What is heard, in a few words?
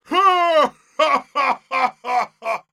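laughter big, Spanish; Heavy; Risa Grande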